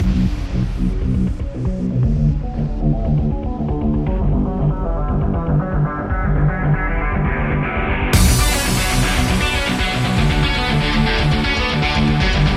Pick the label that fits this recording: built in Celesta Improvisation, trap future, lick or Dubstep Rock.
Dubstep Rock